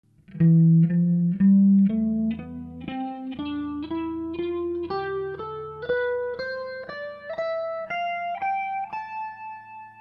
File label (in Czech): frygicka, kytara